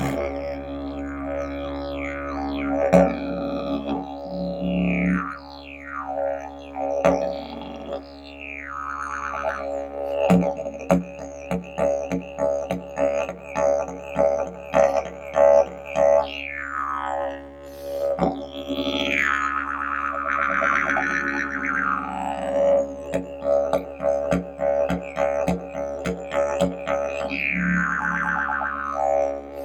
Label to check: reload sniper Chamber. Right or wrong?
Wrong